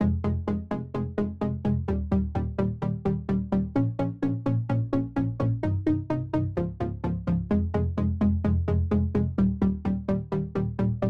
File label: 128 BPM F Min Chords; House; Cymatics; Breakdown Loops; Sydney